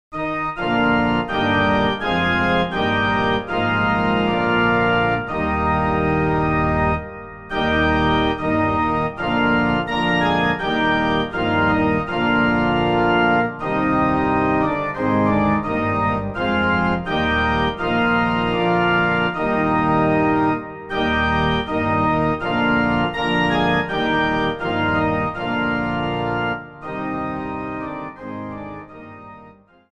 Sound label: inst; Kirchenlieder; liebster jesu wir sind hier